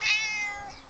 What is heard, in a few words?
meow; space kitty